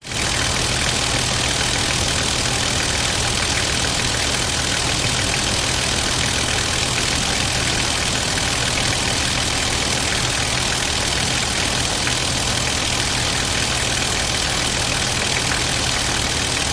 vehicles car diesel engine idle close under hood nissan patrol